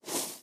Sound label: bonus tokens sliding; vampire